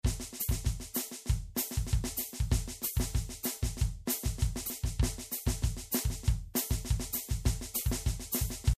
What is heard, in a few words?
mocidade caixa with bass drum